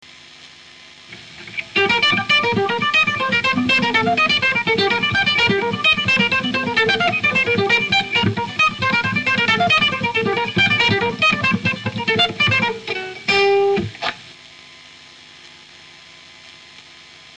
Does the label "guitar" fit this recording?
Yes